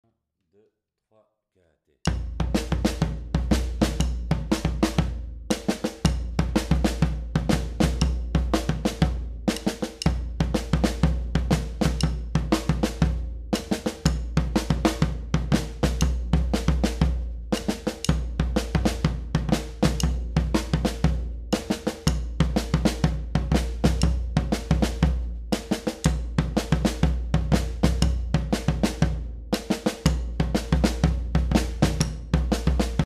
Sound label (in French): Reggae Ternaire, Batucada